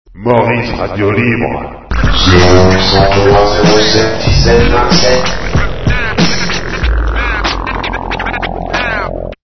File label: jingle